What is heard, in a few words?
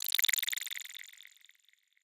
glitch ui wet; Sonniss GDC